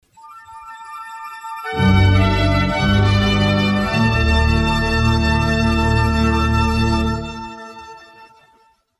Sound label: jingle; radiozurnal